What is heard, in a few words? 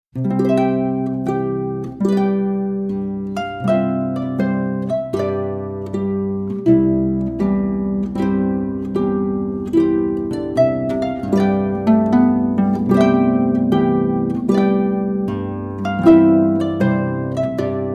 trumpet tune; Trumpet Tune by Henry Purcell; wedding ceremonies